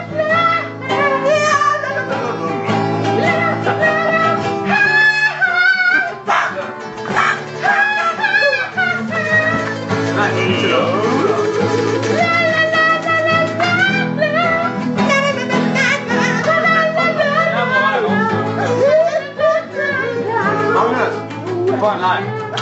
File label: Guitar Jammin